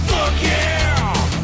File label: fire, weapons